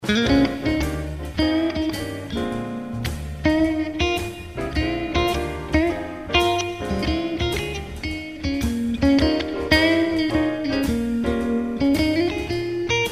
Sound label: Twin Clean, Blues Clean; P90; Neck/Bridge 2